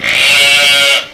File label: sheep; stamps